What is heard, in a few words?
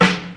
hip hop steel snare drum f key, snare tuned to the F note